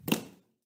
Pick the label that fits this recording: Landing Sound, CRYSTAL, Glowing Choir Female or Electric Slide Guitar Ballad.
Landing Sound